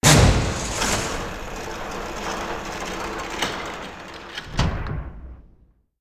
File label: Outer Door Up